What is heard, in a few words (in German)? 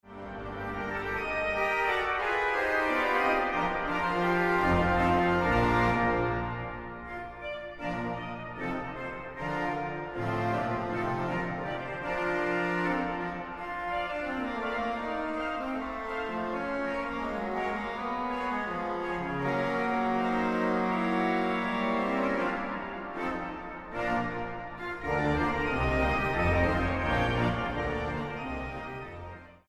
Orgelsolo